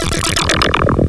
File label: Squidge; Urban Shakedown